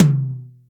Modern High Floor Tom Drum Sound C# Key, Royality free tom drum sound tuned to the C# note